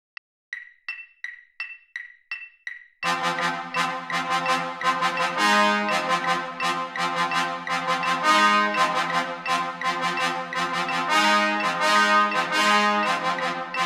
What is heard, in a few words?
tenor trombones, marching band